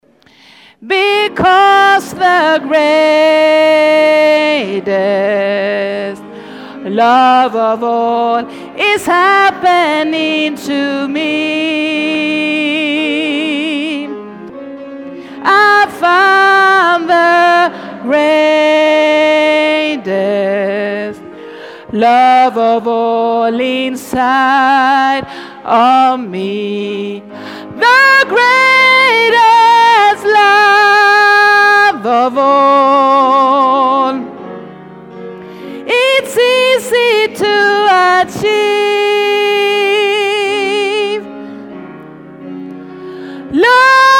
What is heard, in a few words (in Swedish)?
kören